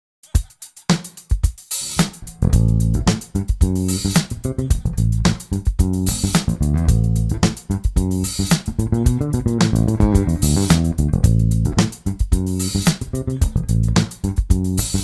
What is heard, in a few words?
Funky Am bassline with fill